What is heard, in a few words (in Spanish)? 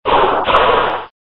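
"Grito de Cacturne", Pokémon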